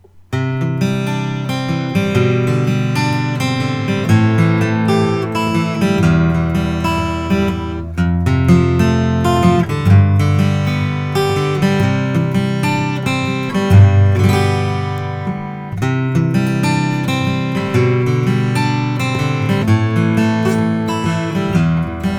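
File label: goodall 000 12 fret short scale goodall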